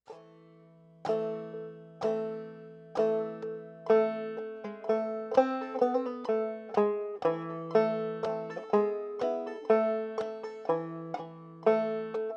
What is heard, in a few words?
slow; Christmas